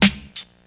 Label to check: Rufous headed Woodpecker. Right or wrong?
Wrong